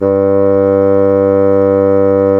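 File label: WIND BASSOON G2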